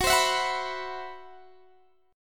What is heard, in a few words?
C Major 7th Flat 5th Gb, chord